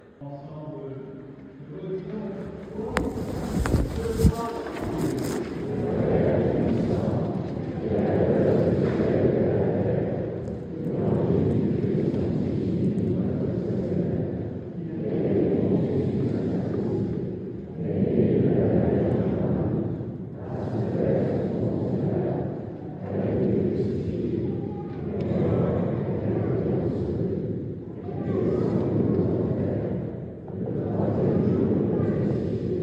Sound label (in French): CHANT CREDO, MESSE; CHEF BOUTONNE 79; EGLISE NOTRE DAME FETE PAROISSIALE